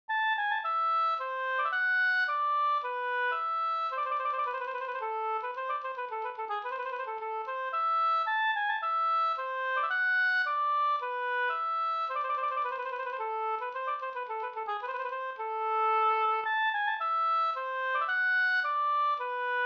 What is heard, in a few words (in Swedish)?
polska Bingsjopolska